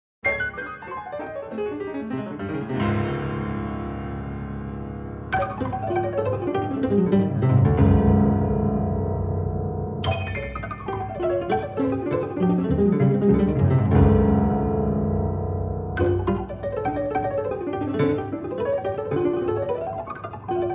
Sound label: prepared piano